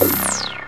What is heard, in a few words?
SWEEPER; Synth FX Misc